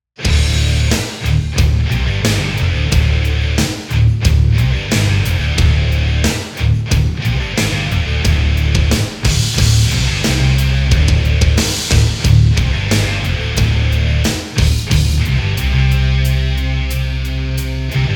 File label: guitar sound